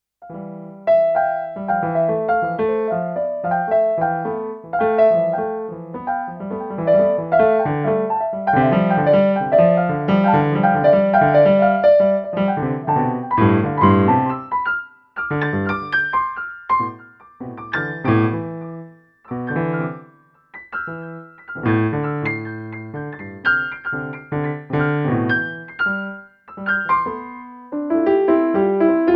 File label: first piano recording